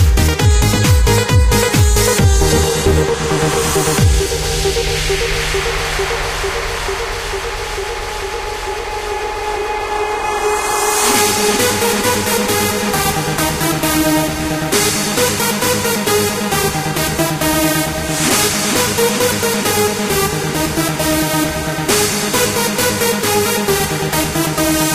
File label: funky groove